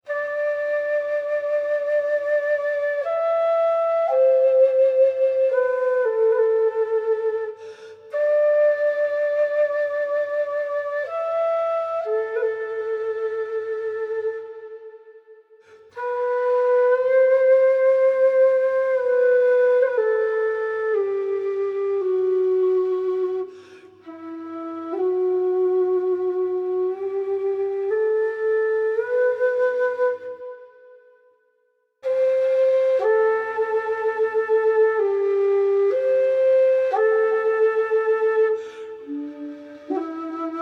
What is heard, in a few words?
Bone Flute; Age of Conan Rise of the Godslayer